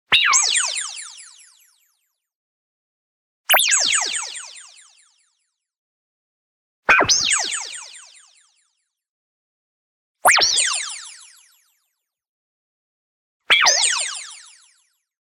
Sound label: ZAP Ping Rise Sweep; boom library; Anime Essentials; DSGNMisc